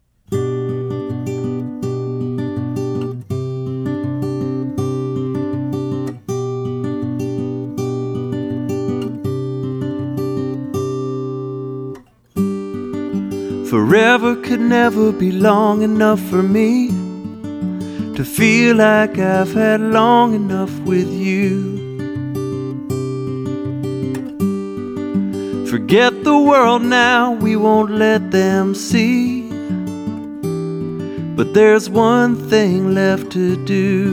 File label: Acoustic Guitar; Melonbelly Acoustic Guitar Players